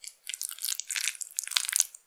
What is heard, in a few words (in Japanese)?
きつきつに無理やり, きつきつに無理やり入れている風の音; 挿入or引き抜く